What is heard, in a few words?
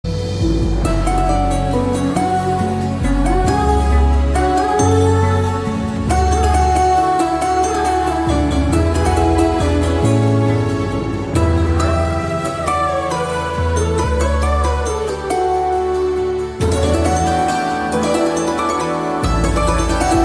Love Bgm; Bigil